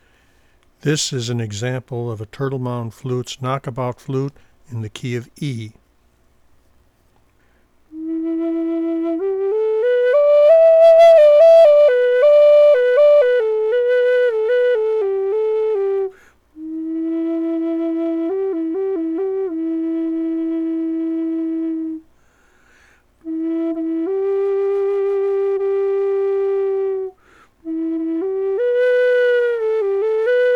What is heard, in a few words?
Knock About E, flutes